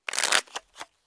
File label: small shuffle, cards